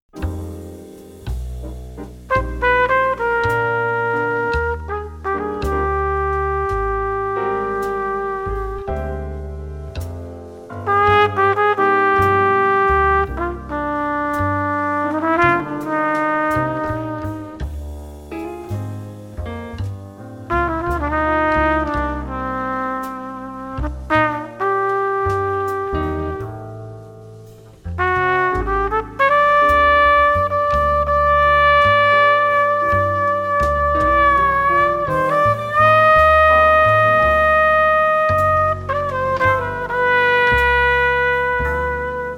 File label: trumpet